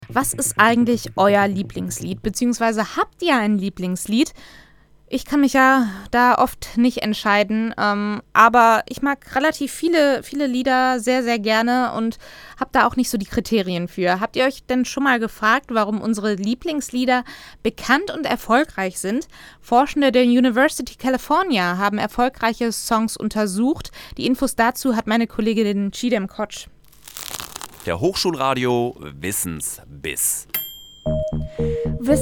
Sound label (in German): Musik